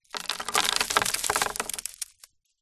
dirt very small stones debris handful drop wood fall grass